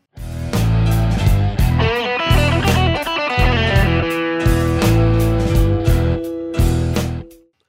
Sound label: E Blues Lick, guitar